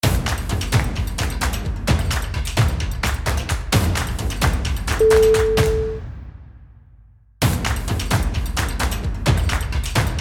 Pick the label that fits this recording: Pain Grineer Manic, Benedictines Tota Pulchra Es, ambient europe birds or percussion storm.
percussion storm